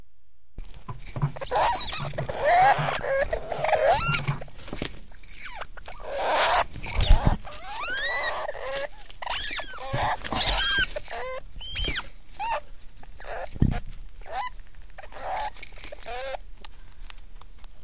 futterstreit

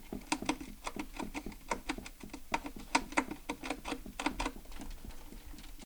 kartonnagen

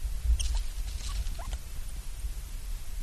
muckern stimmlos

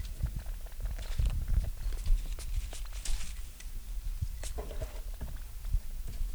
Zwirp schnupf

